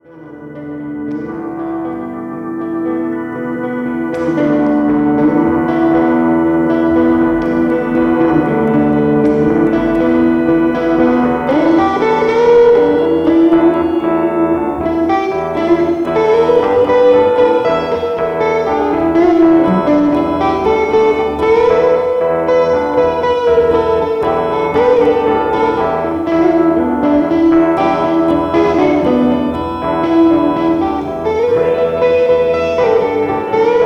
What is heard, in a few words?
Funky Jam